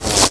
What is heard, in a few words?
tracer